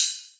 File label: Ride; Equipped Music; Loungin House